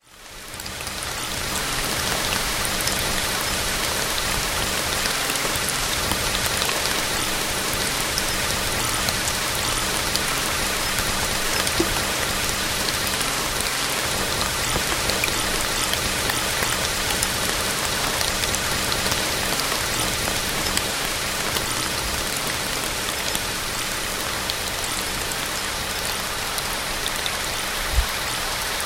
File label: rain sounds for sleep